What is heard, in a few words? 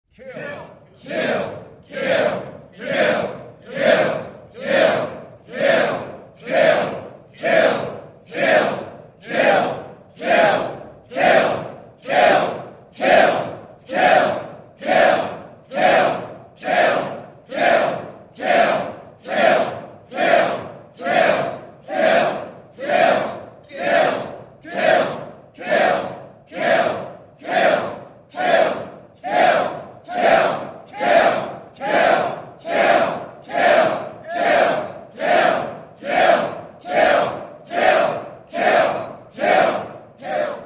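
Male Crowd Eerie Chant, choirs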